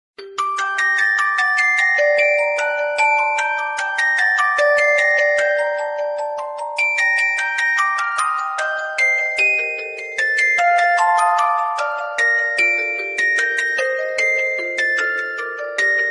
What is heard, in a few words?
sweet chimes ringtone